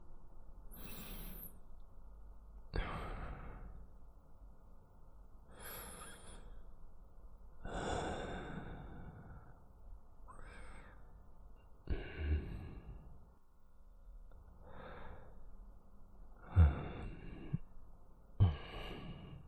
寝息トラック; 一緒におやすみ; vol.2 ツキノワグマ

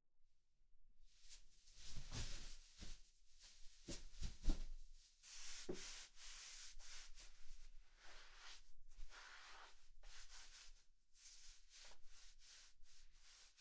自分 タオル で 体 を 拭く, お風呂に関する音; 3DIO

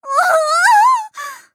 "おほおおおお！", 騎士; ハイグレボイス集

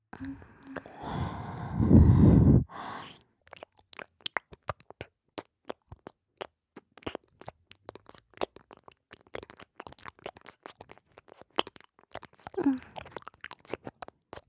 くぽくぽくぽ…, ASMR

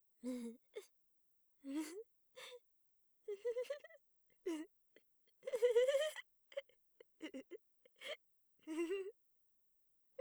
笑うしかない; 効果音なし

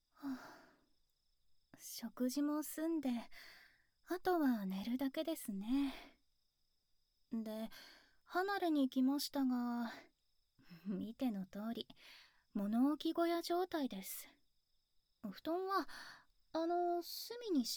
耳舐めとお世話; ウタカタ; うたかたの宿 猛暑の銷夏

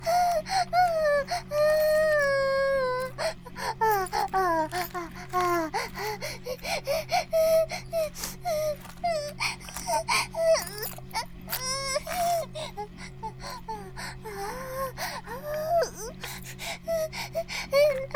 バイブ放置, ASMR

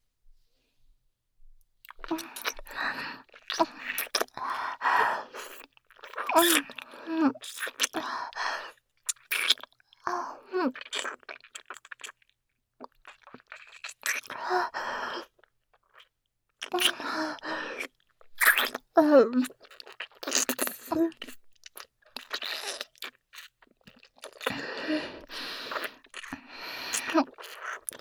濃厚なキス

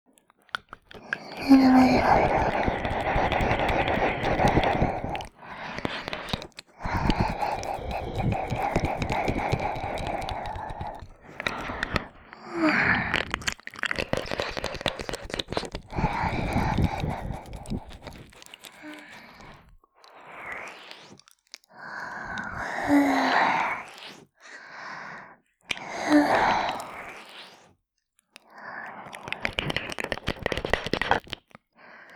お耳全体れろれろ舐め, ASMR

